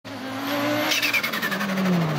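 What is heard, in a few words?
Rally Audi Quattro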